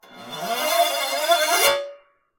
Piano Metal Mallet Scrape Full String Damp Long; Piano Mallet Strikes; InspectorJ; Piano Mallet Strikes and FX

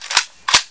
ar1 bolt back, weapons; hl2

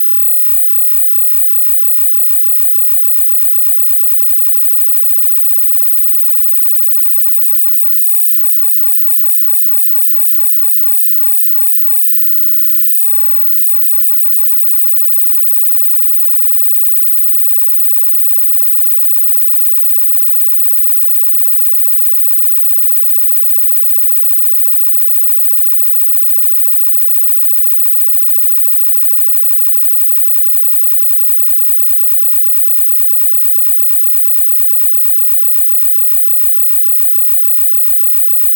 Electrical Interference Crackle

